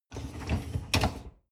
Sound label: dishwasher upper tray draw in short